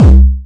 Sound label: Syn Dr, Overloaded Synthetic Drums; style kits